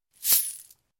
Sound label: added, coins; magicworld